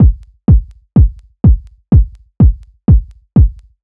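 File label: Kick Loop, 125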